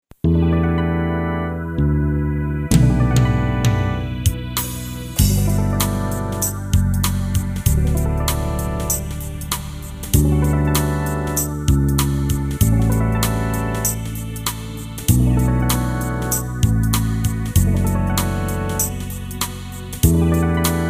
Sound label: Ballad